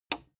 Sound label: plastic button small simple push